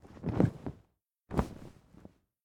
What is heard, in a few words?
Minivan; LOOPERS